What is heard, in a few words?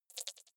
click erratic wide buzz, signale